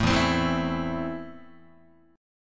E Suspended 4th Sharp 5th, Guitar, Esus4#5 chord; strummed; JGuitar

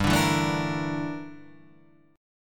G Minor 6th Add 9th G down

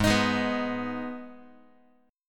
G sharp Minor G sharp down Guitar, chord; G#m strummed; JGuitar

